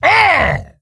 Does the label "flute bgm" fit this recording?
No